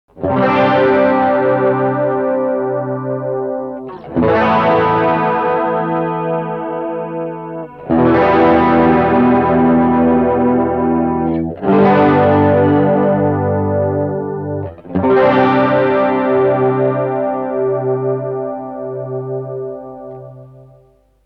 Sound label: light Overdrive Chorus